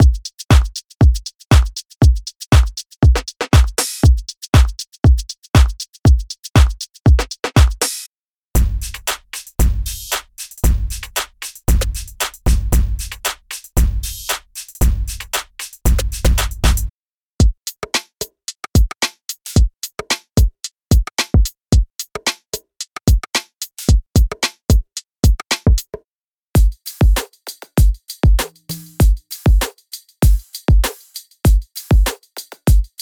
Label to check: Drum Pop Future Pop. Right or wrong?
Right